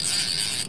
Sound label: FISH PUL